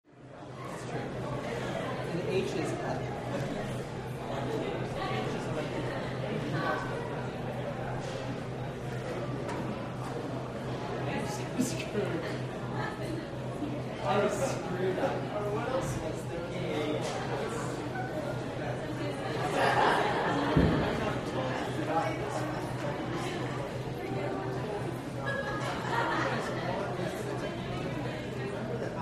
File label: School Walla High Sc, crowds ext